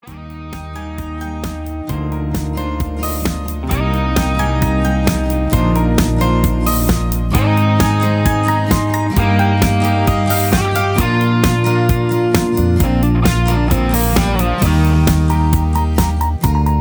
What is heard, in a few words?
Instrumental With Leads